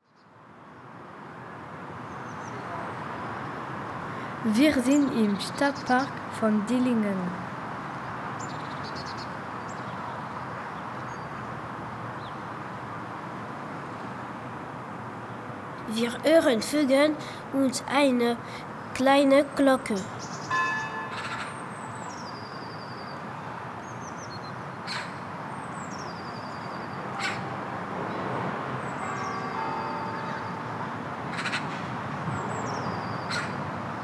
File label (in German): Stadtpark; DILLINGEN